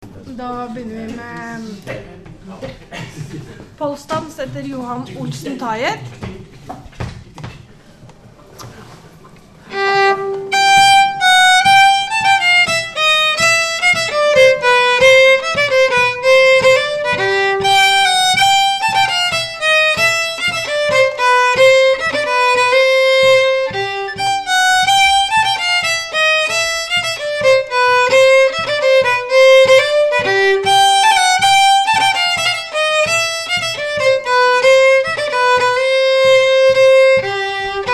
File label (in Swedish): Polskdans solo; Norge